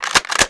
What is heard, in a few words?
shot load, shotgun; weapons